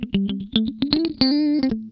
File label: Funk Guitar; Zero G - Funk Guitar